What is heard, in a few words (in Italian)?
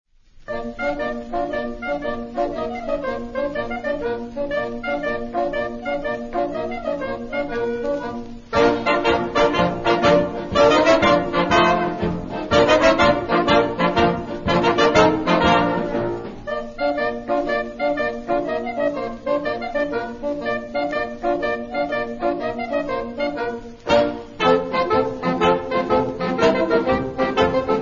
ORCHESTRA